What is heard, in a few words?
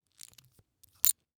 Industry Tool Wire Splitter Remove Wire